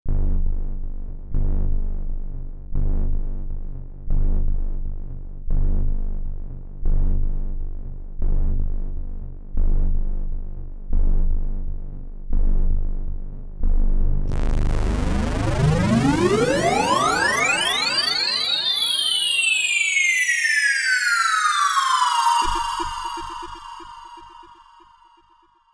Weird Pulsing

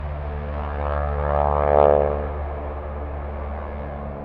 Ww2 Airplane Natural Reverb

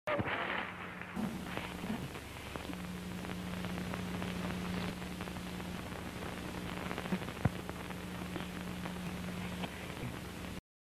Sixties Tv Static

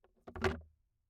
Wood Bucket

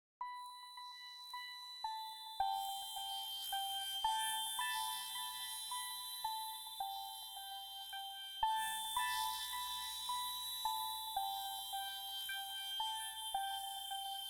Space Intro

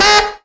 Sax One Shot

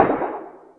Cartoon Boing

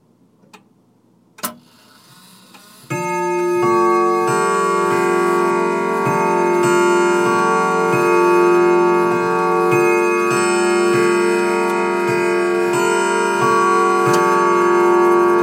Grandfather Clock Chime

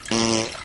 Fart

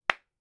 Clap

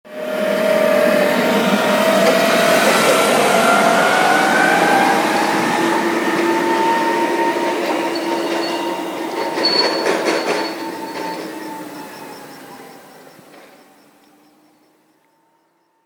Forklift Driveby

